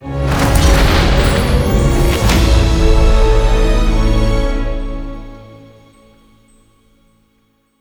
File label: bonus Free Spins Activated; netent